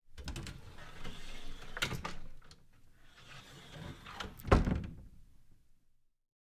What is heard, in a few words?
Wooden Door